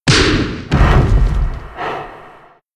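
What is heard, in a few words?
giant lynx death; monster; Senillinea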